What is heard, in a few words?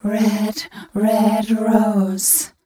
f mel d, fem Vocals